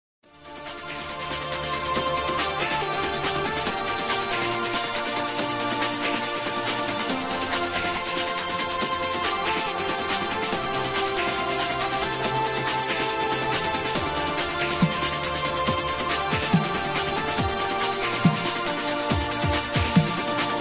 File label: dubstep metalstep remix